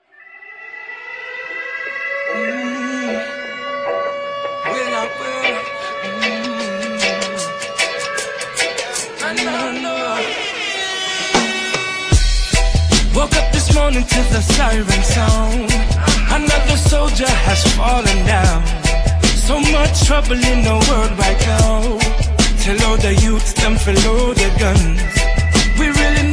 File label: island vibe